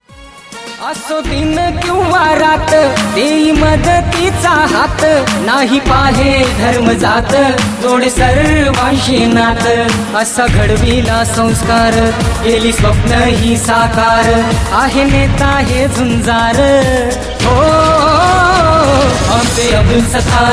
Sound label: Song ringtone